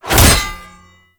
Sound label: Bayonet Melee Quick Slam; Warframe; Tenno